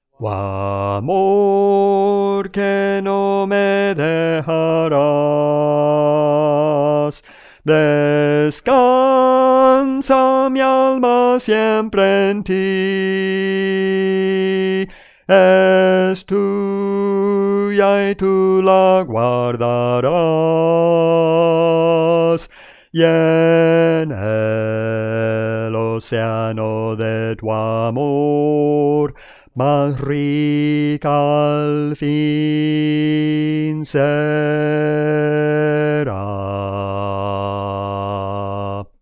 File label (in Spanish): Bajo, Cantos Espirituales